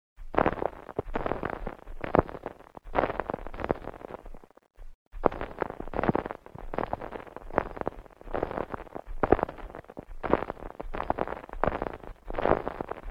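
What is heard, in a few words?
walking on snow Texture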